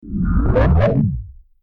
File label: time start or stop; FXHome